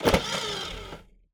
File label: Eject, DVD Drive Internal